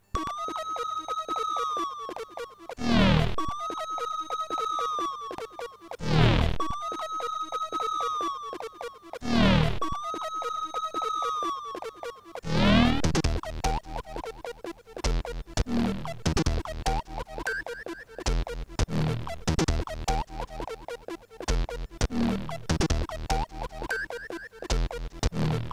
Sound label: sid analogue; sidv2; jeff